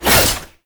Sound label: Melee Whoosh; Warframe; Oraxia; Abilities